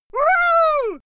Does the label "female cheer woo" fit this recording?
Yes